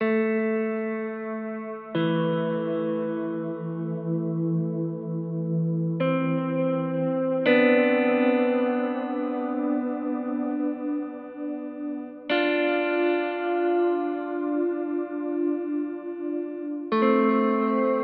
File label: guitar; Void Bastards; Krell